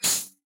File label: snake attack; soundscrate